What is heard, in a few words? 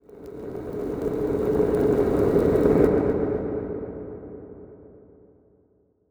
FX Brief Flare; ignite